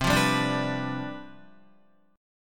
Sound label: C Major 7th Suspended 2nd Suspended 4th C down Guitar, chord; CM7sus2sus4; strummed; JGuitar